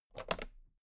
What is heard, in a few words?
toolbox latch close regular